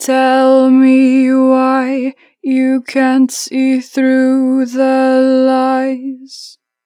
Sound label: Natural Vibrato, Vocals, Tell me why, 4 bar vib; Harmor Vocals